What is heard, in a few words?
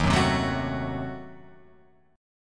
D sharp Diminished 7th D sharp Guitar Open F; strummed; D#dim7; 3,1,0,1,0,1; JGuitar